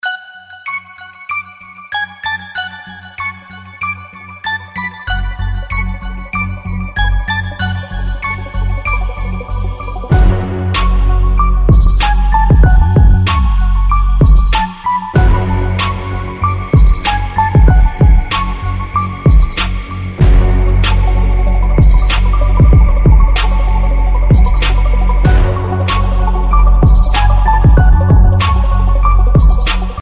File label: Underground Hip hop